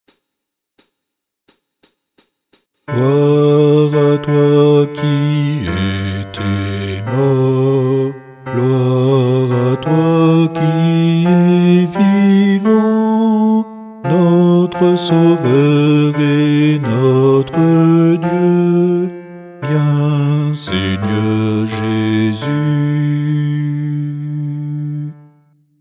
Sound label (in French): Messe de Saint Jean Anamnese Guide Chant Basse